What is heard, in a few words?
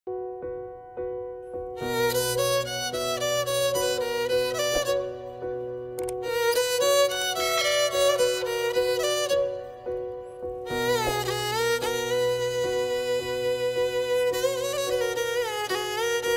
sad bgm flute ringtone; sitaramam